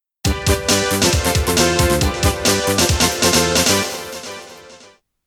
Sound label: Fanfares; Sailor Moon S; 3DO